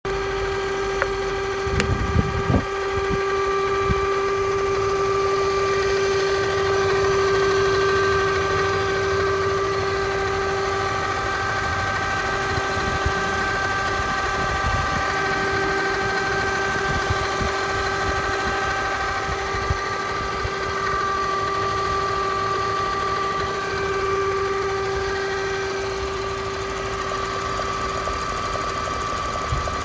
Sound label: engine noise ls460; ls 4th gen 2007-2017